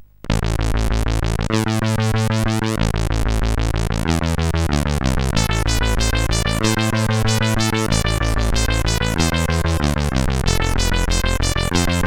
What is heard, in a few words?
sid, CSG8580R5